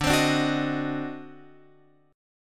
D 7th Flat 9th D down Guitar Open F; D7b9; strummed

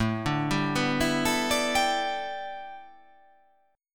A 7th Suspended 2nd Suspended 4th

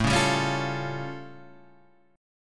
A Augmented 7th A; A+7 Chord {5 4 5 6 6 5} strummed

